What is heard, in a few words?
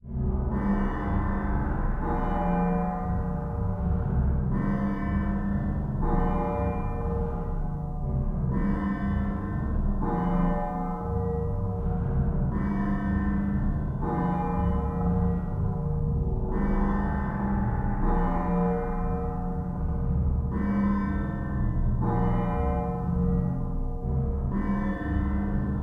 Percussion; Age of Conan The Secrets of Dragons Spine; Serpent Men (Ocean of Sand Percussion Short Version)